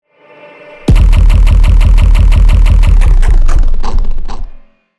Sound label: Bass Impact; neuro; BIG5AUDIO